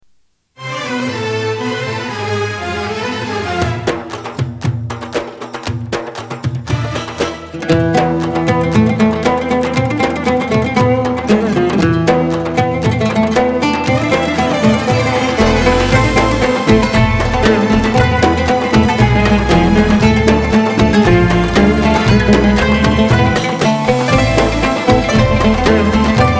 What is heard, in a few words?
music